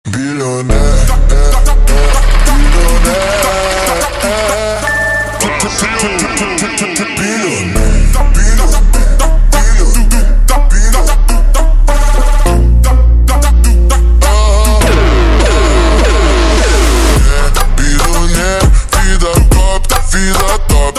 Phonk super Slowed; funk

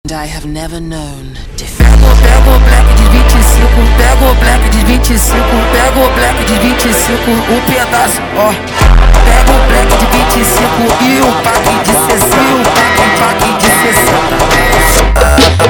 Funk

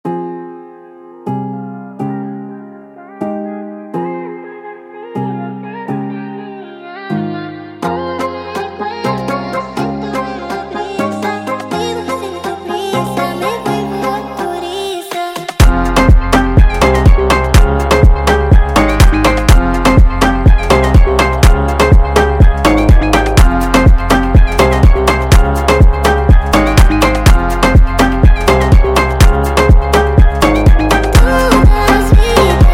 sped Up, funk

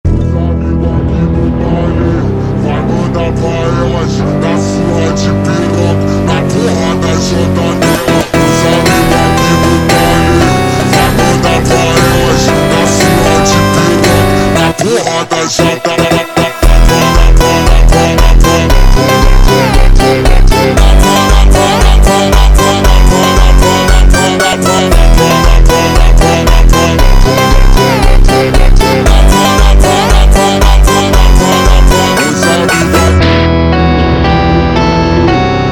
Funk slowed, funk

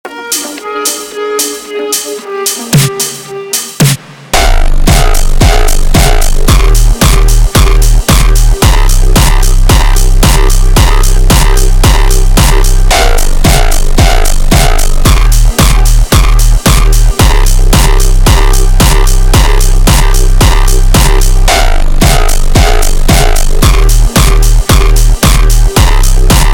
Slowed, funk

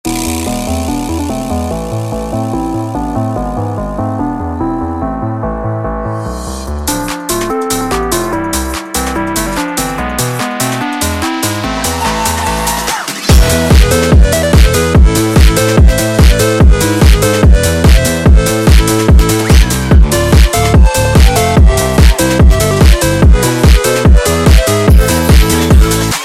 Phonk

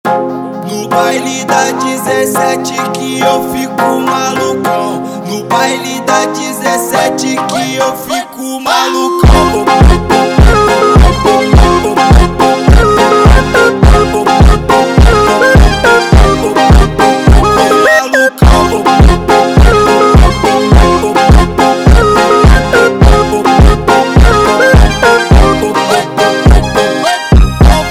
slowed, funk

